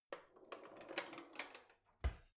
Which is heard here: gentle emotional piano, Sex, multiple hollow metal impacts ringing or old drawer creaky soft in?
old drawer creaky soft in